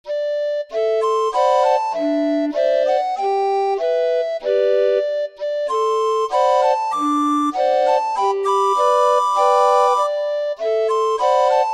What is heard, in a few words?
tale of the Kangaroo, orgue barbarie